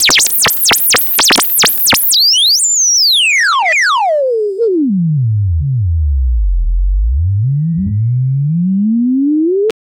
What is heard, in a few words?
slot machine level pulled; casino game